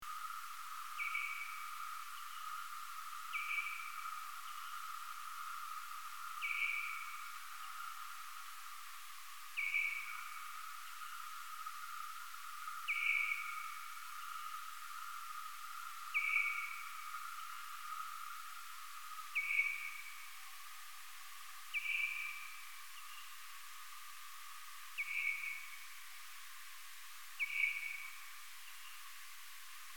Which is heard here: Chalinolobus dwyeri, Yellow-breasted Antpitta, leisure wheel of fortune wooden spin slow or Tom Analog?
Chalinolobus dwyeri